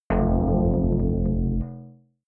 synth bass bounce wobble short

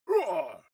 male shout hit soft

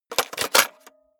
cassette into cassette player

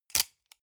sunglasses plastic grab hard

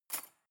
cutlery cake fork drawer place

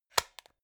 plastic remote battery compartment close

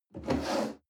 chair wood take slide hard

